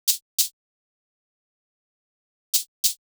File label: Shakers fill in 96 BPM